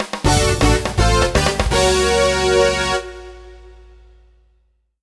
Normal Score; Super Monkey Ball 2; Monkey Target 2

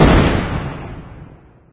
Bomb Hit

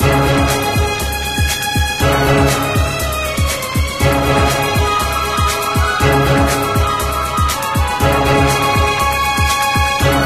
orchestra; Drago Noka; PS4; bgm maoudamashii

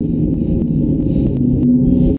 breathing metal; a dump in the dark

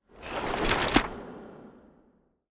Book Open Back

